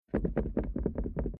Car Classic Bentley Eight Wing Mirror Movement Wobble Rattle; Transport